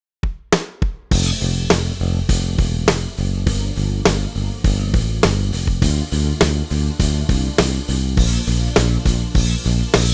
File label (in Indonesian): chord drill iringan rock improve, bass